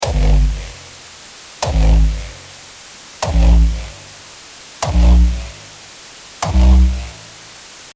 REVERB kicks, Hardstyle kick; waveshapez; Hardstylekick pack vol

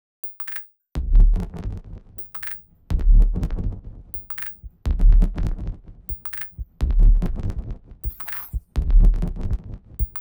Pedaler Fiddle Bends

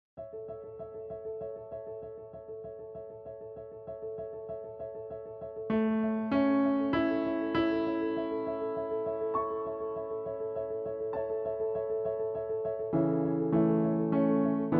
Piano Duet; Blue Danube Opus 314; Strauss

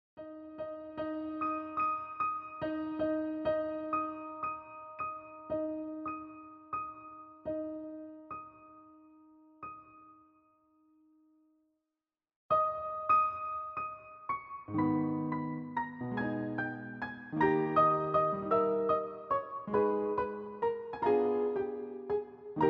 piano roll; La Campanella; Paganini Liszt

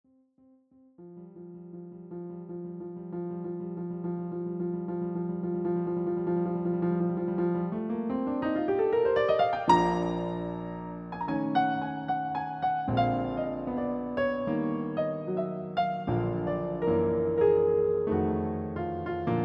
Rhapsody in Blue Piano Duet; Gershwin

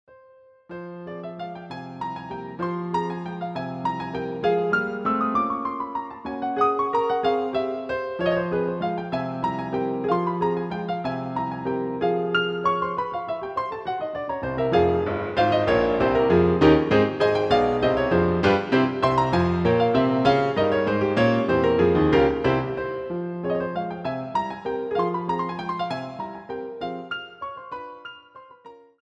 Piano Concerto; No 2 Opus 21 third mov. Allegro Vivace; Chopin

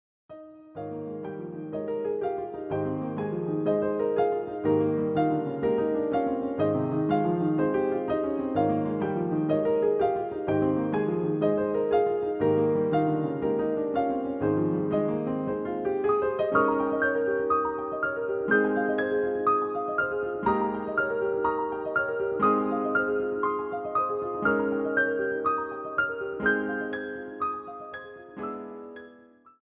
Piano Concerto; No 1 Opus 23 First Movement Second Part

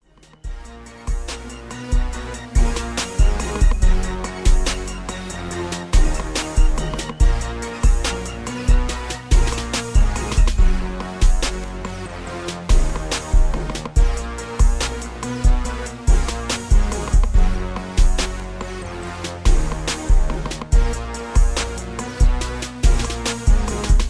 71BPM

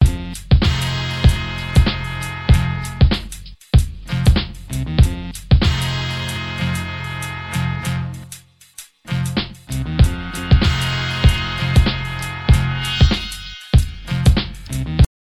beat

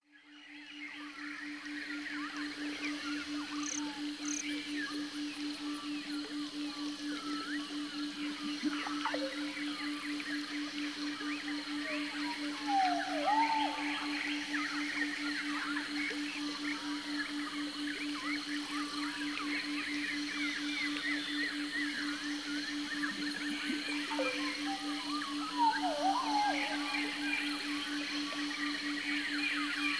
Rainforest Binaural Beat Sleep Induction

